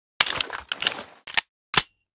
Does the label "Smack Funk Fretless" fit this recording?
No